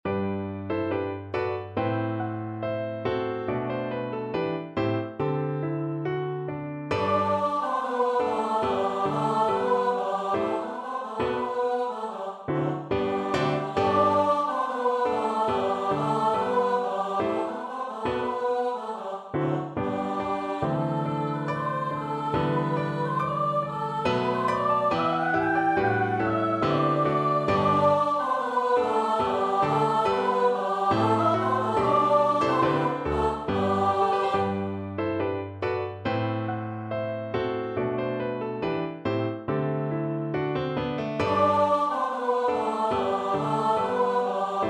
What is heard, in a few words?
deck the hall SATB organ vocal score